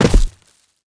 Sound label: land grass, footsteps; player